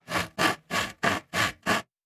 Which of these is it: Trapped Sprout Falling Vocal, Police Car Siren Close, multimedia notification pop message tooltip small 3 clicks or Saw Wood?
Saw Wood